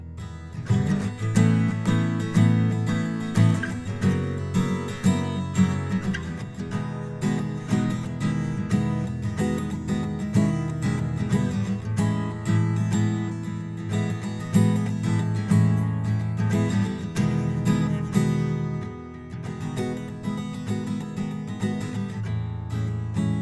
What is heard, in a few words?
takamine hall; tc electronic konnekt 6